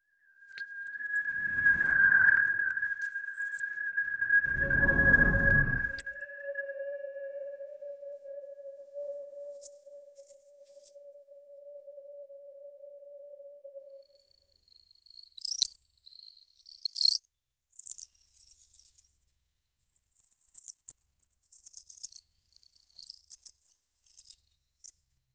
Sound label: beautiful alien jungle